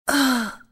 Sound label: death, female; csco; csgo; zbm3